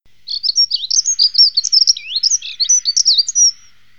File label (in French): accenteur